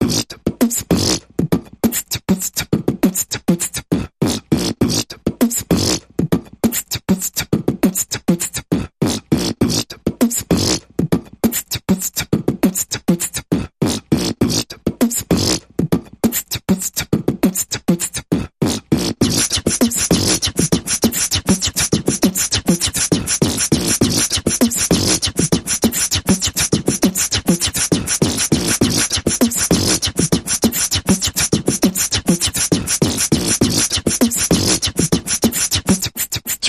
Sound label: Big Mouth Percussion Variation